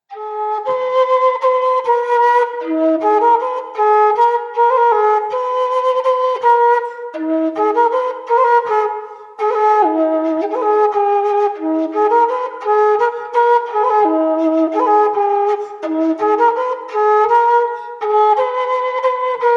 Romance; Romance de Montlosier 1793

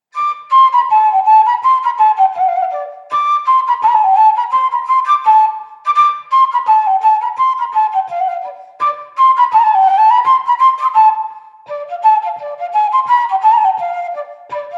Scottisch suspensive

